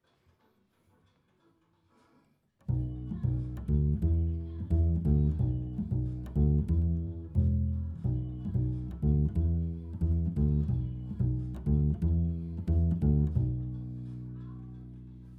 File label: Bass Mic